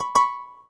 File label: key menu inactive; Samsung SGH-J700; Keytone